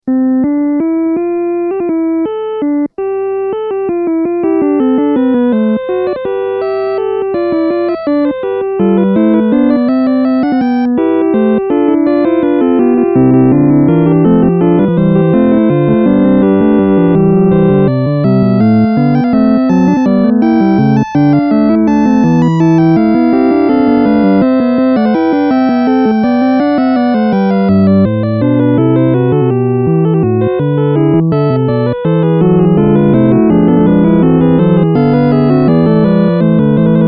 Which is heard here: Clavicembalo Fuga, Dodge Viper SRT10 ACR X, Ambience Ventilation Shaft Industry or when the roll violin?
Clavicembalo Fuga